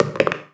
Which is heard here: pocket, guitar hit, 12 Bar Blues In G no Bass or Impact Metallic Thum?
pocket